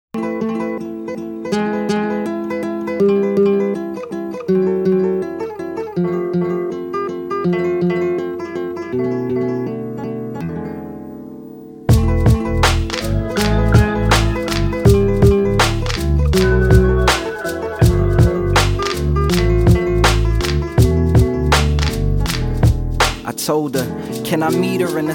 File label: Harps